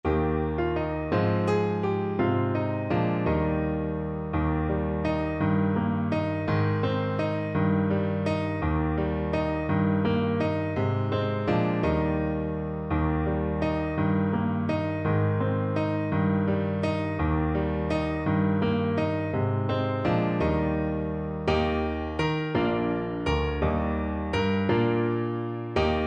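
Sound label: blow the winds, alto saxophone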